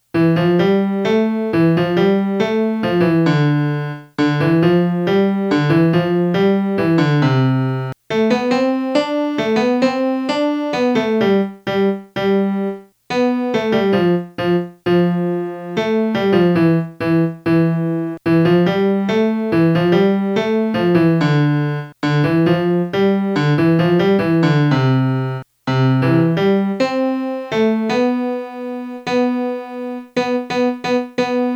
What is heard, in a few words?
melody